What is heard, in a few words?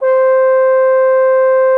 TROMBONE; Xsample VOL-3